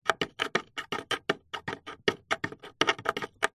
bird pigeon walk claws on wood

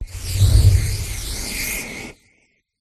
Fire Spell Soul Fire; Sound Spark

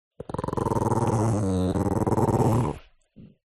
DOG Chihuahua Growl; ANIMAL; smartsound

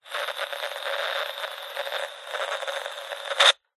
cb radio cheap static through speaker clicks

